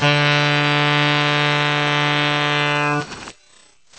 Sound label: guitar reed pretreated